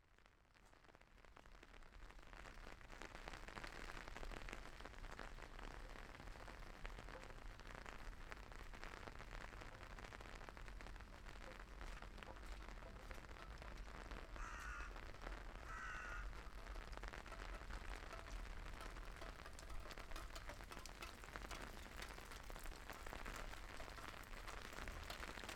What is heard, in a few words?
Gabalfa rain